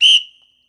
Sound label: Basketball; Sfx Basketball